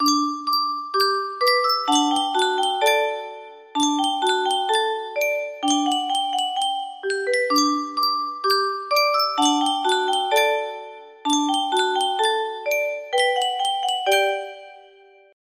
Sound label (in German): yunsheng spieluhr, music box melody